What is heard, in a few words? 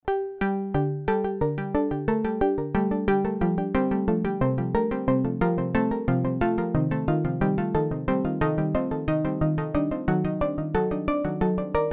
iSEM Clockwise Arp Delay FX